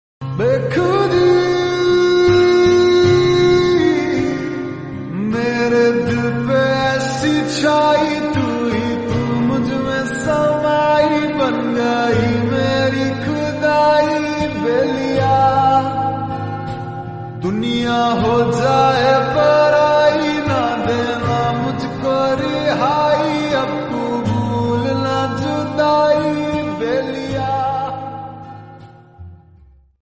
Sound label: Hindi Lofi